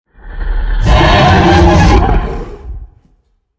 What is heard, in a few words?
Rockdrake sound